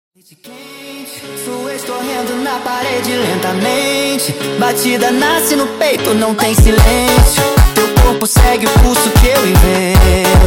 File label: Montagem sped Up